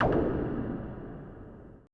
AMBI FX

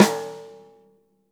rimshot snares on; JazzFunkKit

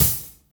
HAT; East West; Funky Ass Loops

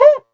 technox perc; Quasimidi Technox

